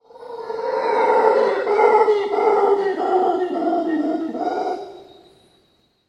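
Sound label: howler monkey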